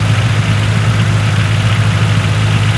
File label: Vaz Priora Low